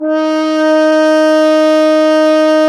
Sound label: FRH, Horns; Sound & Vision - Gigapack I CD 2 (Roland); Brass&Woods1